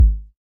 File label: move; quatuor